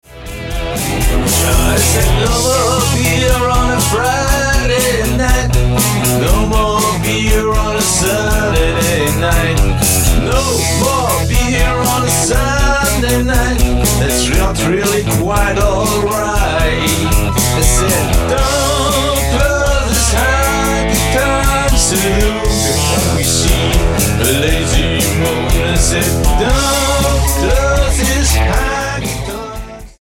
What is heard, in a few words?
honky tonk saloon